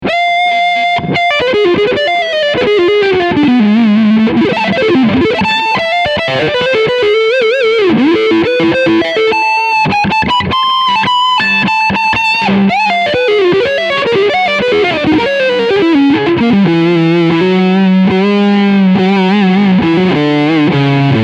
Leadsound Front EMG

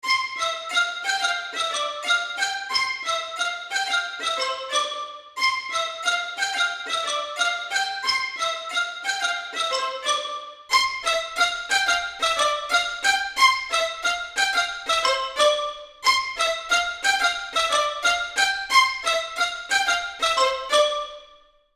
Mic Stage Close

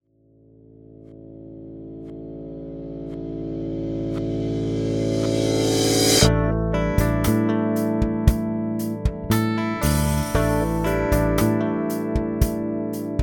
535 Traveller Processed louder input max

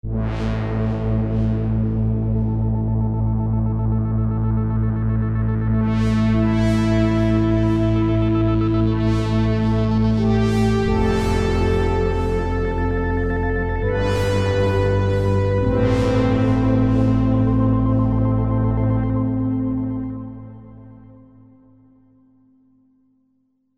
arturia cs 80; gyros

